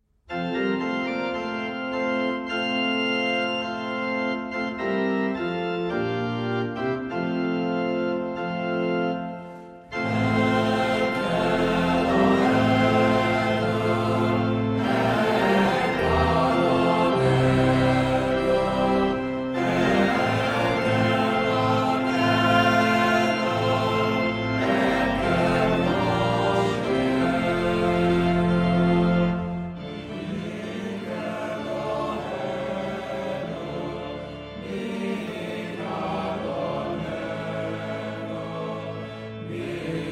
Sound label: En Kelohenu, Sephardi; Hannukah